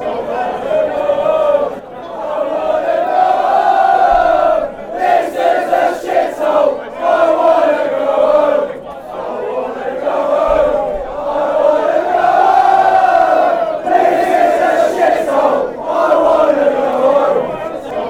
i wanna go homeee, fan chants

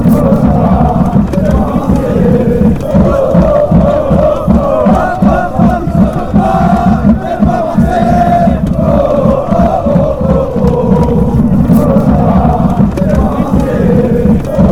oh oh, fan chants

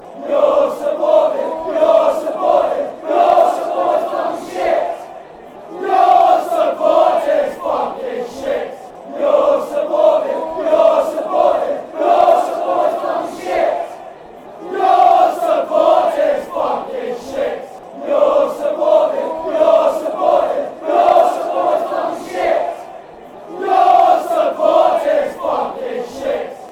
your support, fan chants

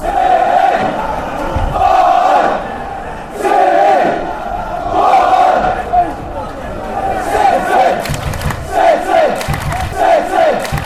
spell it out city, fan chants

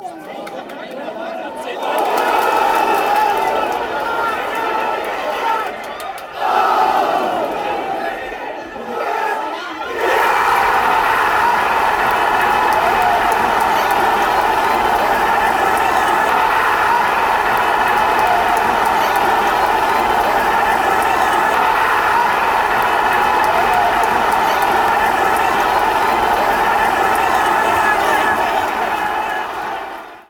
score, fan chants; everton

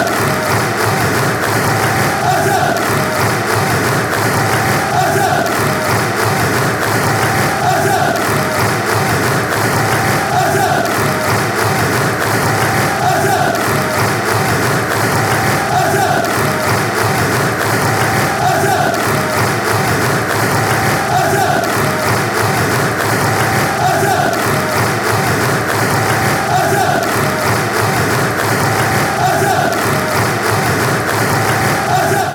clap, fan chants; everton

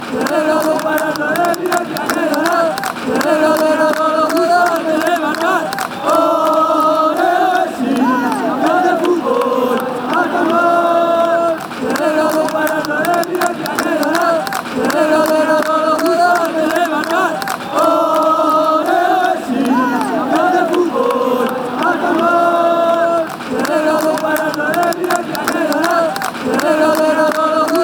leo messi dios, chants

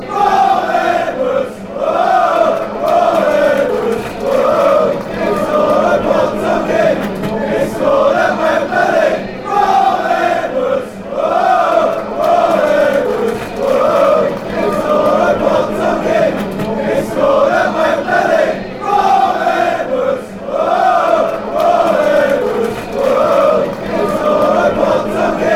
woaah, chants; rob edwards

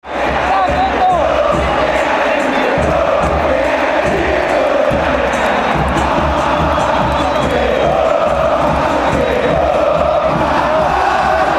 chants

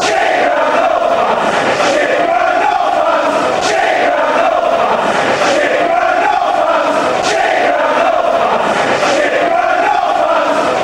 ground no fans, fan chants

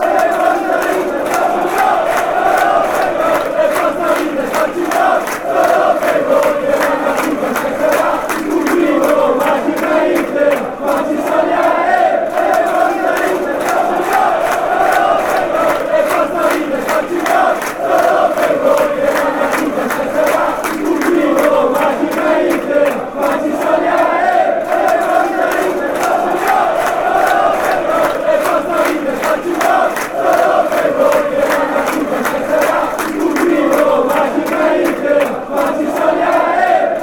only for us, chants

